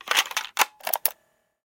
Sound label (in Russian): zvonkij skripuchij tyazhyolij zvuk zavoda stariinnih chasov